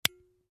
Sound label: Metal flashlight button press to switch on or off